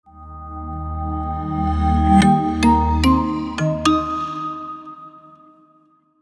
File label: Short Intro Logo